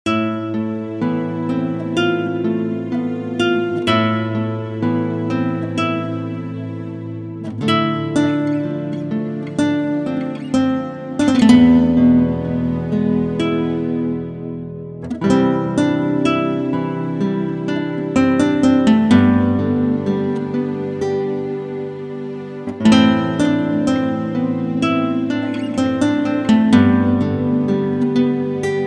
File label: Guitar